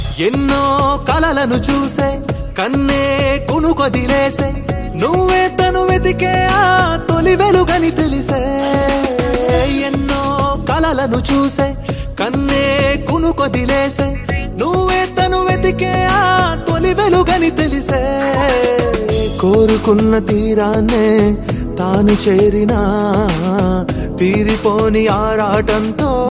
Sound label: telugu ringtone